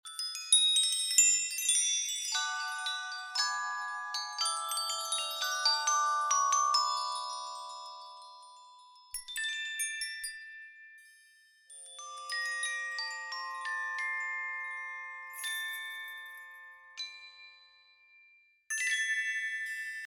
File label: Jingle bells; Relaxound; Alle zusammen